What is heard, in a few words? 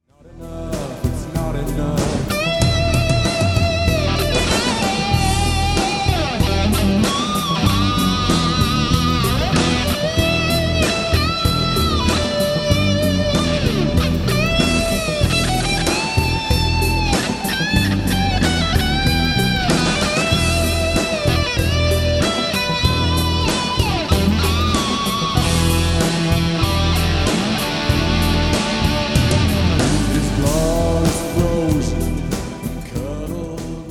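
Solo, Boss HM2, Mesa, Bassman; GE7; Mesa (EQin)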